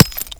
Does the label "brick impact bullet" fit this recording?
Yes